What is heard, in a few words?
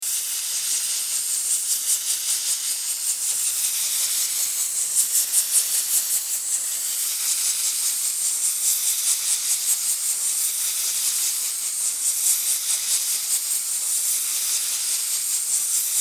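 skorovarka, pressure cooker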